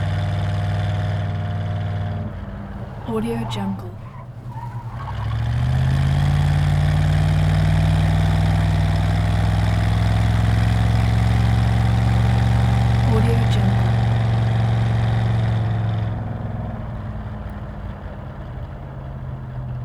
1969 chevrolet chevelle convertible; prosoundfx

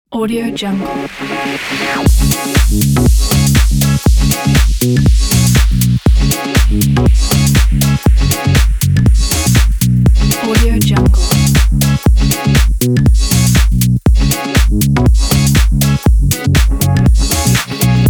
the funky house

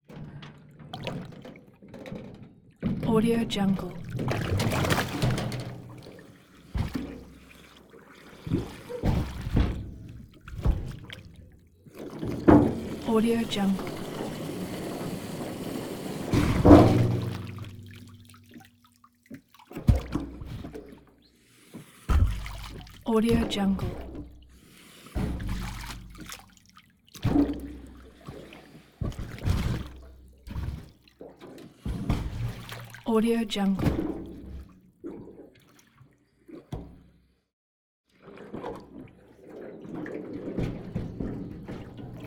water large barrel in pool rattle; soundmorph

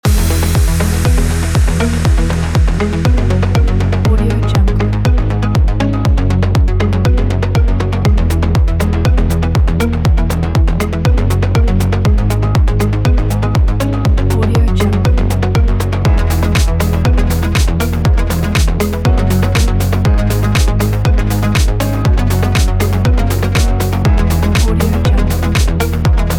techno energetic kit

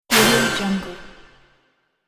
fantasy battle spell

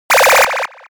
science fiction space 90s laser gun

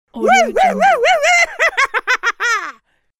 male success laugh scream

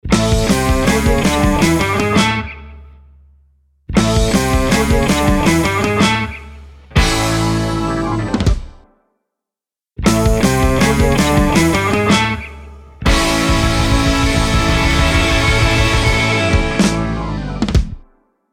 rock n roll classic logo; mrorangeaudio

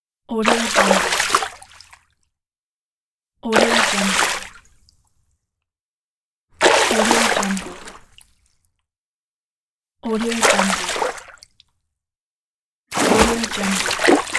water splash

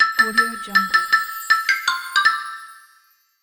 christmas xylophone; blackdragonfx

